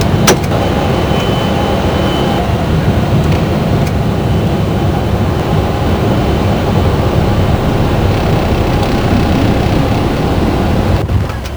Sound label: B747 gear up; Aircraft; FS9; PF2K